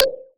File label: FILTER, PERCUSSION; Drum Hits